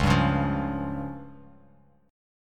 Db Major Flat 5th, Guitar Open F; strummed; 1,2,1,0,x,x

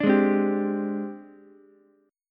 G sharp Major 7th Flat 5th Ukulele; JGuitar

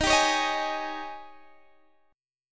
G sharp Major 7th Suspended 4th, D sharp

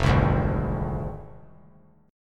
F Minor 6th F down; Fm6; strummed; JGuitar

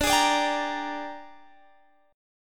A sharp 13th

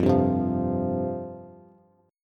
F Suspended 2nd F Bass; Fsus2 strummed; JGuitar

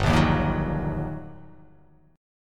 G Minor Major 7th Sharp 5th, GmM7#5 chord; strummed; 3,1,1,3,3,1,x,x; JGuitar

